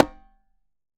Congas Quinto mute; Best Service; Festa Latina VOL-1; Single-Hits

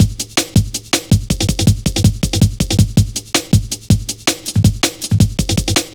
Drum Loops, Jungle

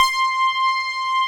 OB BASIC

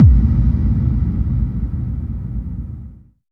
FX Kicks

